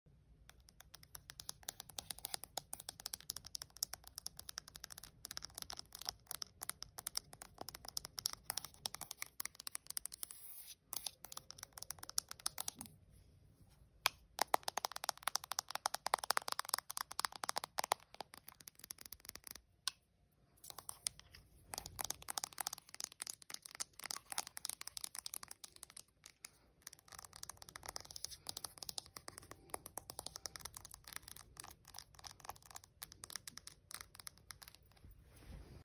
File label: touch phone